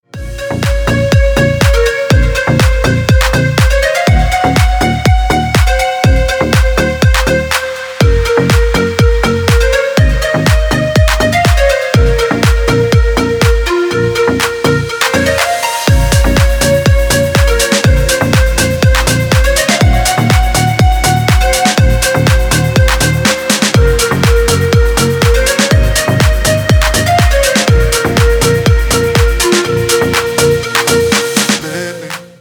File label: deep house